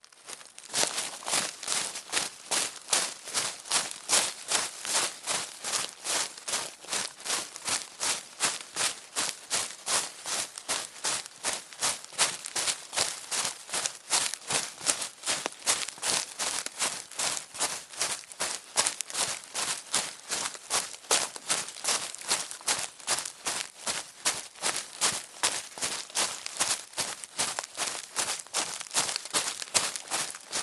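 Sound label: running leaves foley footsteps; Sonniss GDC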